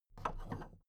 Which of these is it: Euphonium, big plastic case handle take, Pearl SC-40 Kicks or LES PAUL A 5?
big plastic case handle take